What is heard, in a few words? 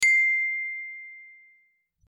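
bell ding alert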